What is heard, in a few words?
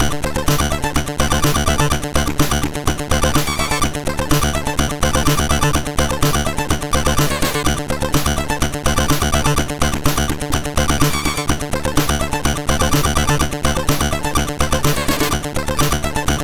Hires Demo Kit, MOS6581R3